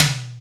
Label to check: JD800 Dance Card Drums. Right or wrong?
Right